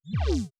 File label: sine wobble, bass